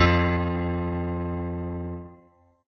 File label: piano; pianojs